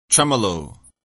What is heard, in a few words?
tremolo